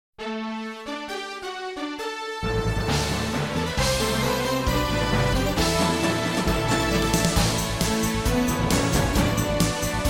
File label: Victory soundtrack; dungeon quest roblox